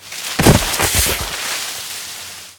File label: fail jump, hunt; CosmicRage